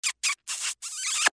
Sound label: Kiss